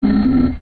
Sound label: mech servo; Sid Meier's Civilization 4 Complete; Beyond the Sword; Units